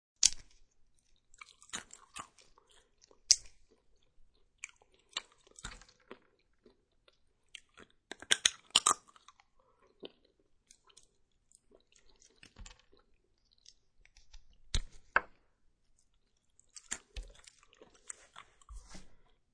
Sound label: Biting and chewing a pistachio.; Eating